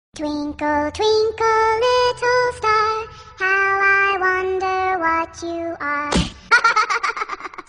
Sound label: Cute Baby Sing